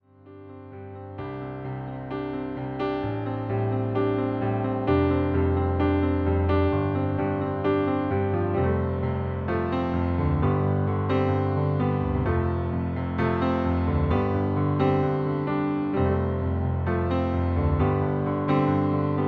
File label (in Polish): instrumental bez linii